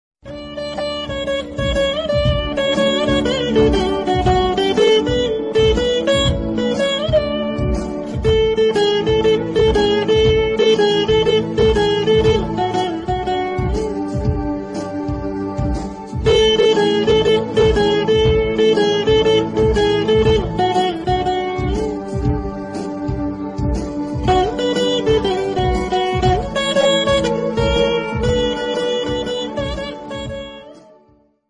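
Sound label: background music, Telugu Ringtone; Guna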